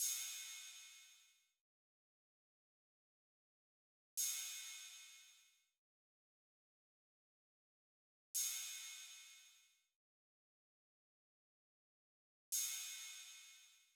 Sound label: Drum Loop 115 BPM Open Hat; Loop Stems; Cymatics; DOPE DRUMS VOL. 1; Coffee Drum Loop